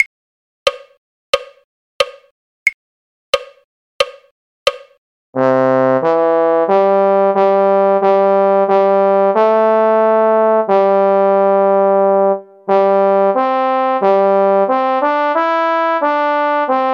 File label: trombone; carols